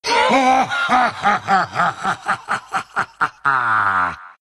laugh; lich

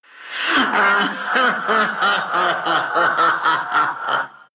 laugh; death prophet

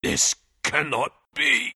death; lone druid

